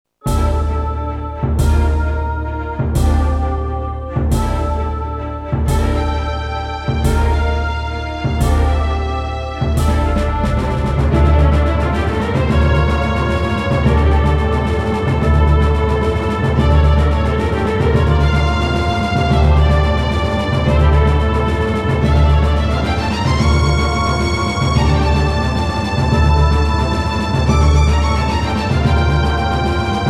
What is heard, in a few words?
Synthesizer Version; Super Famicom Magazine Volume 12; Visconti Castle